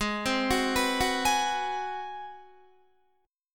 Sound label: Ab 7th Sharp 9th Guitar, chord; Ab7#9 arpeggiated; JGuitar